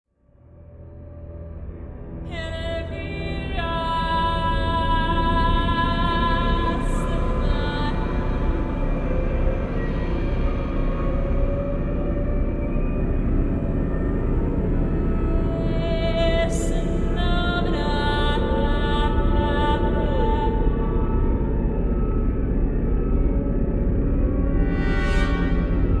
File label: without narration; children of morta; Procedural Events; Mystic Book Pt1